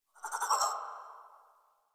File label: Tree Critters Angry; Warframe; Duviri